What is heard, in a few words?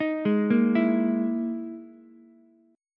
E Major 7th Flat 5th, Ukulele; EM7b5 arpeggiated; JGuitar